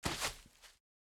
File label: walk, turtle; mob; minecraft